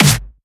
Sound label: Snare; FLPRO